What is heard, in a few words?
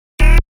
Bass Tech Filtered 160 D#; Bass Tech Filtered2 160 D#; exciplex sample presets pack; JinoBeats